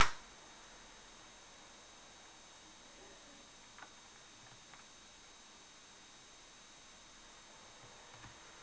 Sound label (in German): grundrauschen